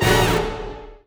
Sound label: Combo Stab